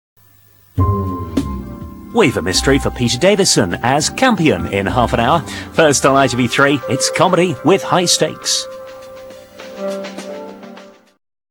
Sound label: jingle; itv3